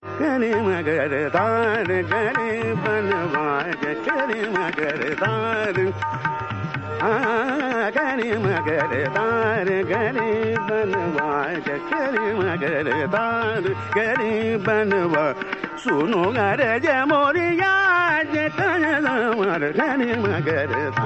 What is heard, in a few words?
Yaman D. Trital; abhinav geet manjiri; Karim Kartar Garib Nawaz